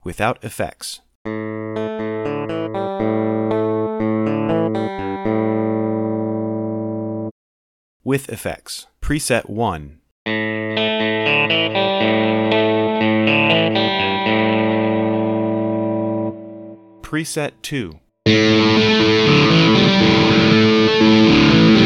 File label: shred amp simulator; Mixcraft